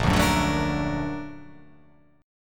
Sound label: A sharp Augmented Major 7th A sharp, guitar